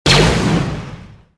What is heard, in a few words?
fire, weapons; atst